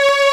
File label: Battle loop